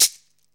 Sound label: CABASA, PERCUSSION; AMG - Vince Clarke Lucky Bastard